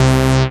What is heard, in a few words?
SYN SH, Roland; Sound & Vision - Gigapack I CD 2